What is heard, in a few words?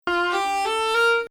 Ajam